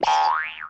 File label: vintage cartoon boing; Cartoon Sound Effects Kit